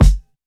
Classic hiphop kick

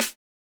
snare; Electro; alternative

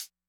disco hihat

